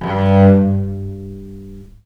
viole, historical instruments; VIOLE MUT; G1; USB Soundscan vol.23; AKAI

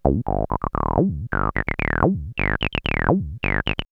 303 Loops; X-Static Goldmine

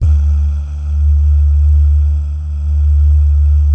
BAH, BAHS GUYS; Spectrasonics; Vocal Planet; Jazz FX

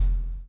CHAIN; Spectrasonics - Backbeat

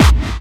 KIT HOU; Best Service

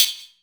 Metal

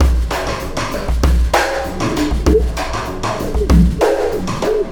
Alien Influence Beat, Processed Drumkits; Sonic Foundry (Sony Creative Software) - Processed Drumkits Zero Gravity Beats

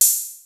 XD-5, DRUMS PERC, BOSS

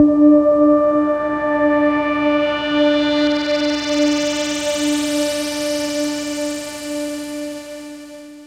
Chime Bubbles D, Pads; Drum & Bass; Total Drum&Bass

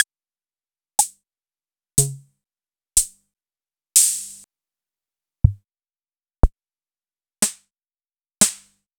Wurlitzer Swinging Rhythm

